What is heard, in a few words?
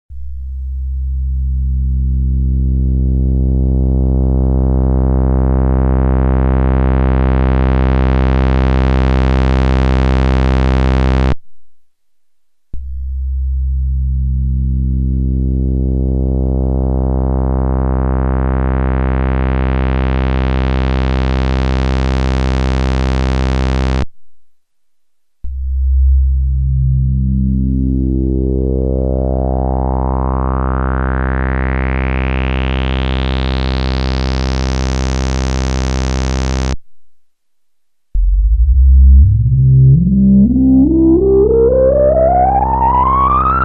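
RS6 character sweep fat; Redshift 6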